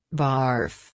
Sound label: barf; girias